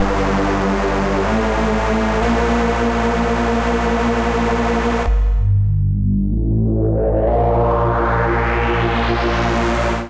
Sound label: Bass Gm 95 Bpm; MIDI Clips; Music; Ravage; Ableton; Factory Packs; Vibrations; ModeAudio